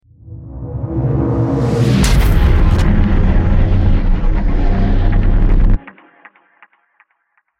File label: evil glitch